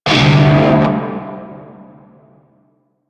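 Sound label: Shawzin Pentatonic Major Chord D; Music; Warframe; Narmer Shawzin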